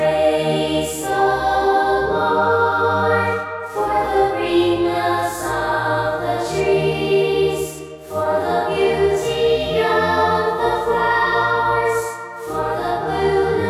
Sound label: choir